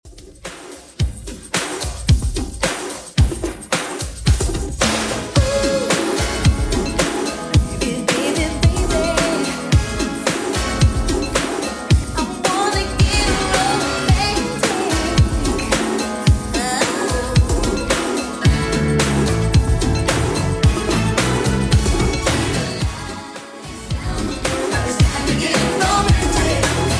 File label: 90s Redrum 110bpm